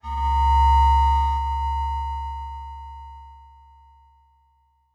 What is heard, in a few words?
Transition Stab Dark Musical Tonal; Production Element